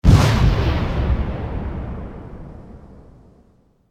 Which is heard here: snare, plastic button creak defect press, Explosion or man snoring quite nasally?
Explosion